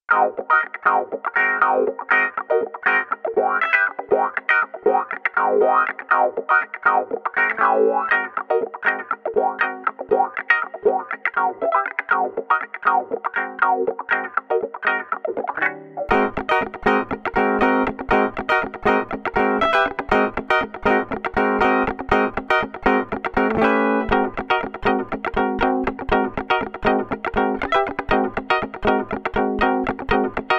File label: Funk Guitar; Evolution